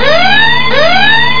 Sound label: red alert